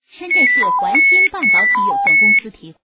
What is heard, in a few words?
Telephone Ringtone